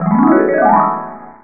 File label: level